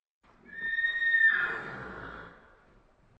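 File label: neighing in the stall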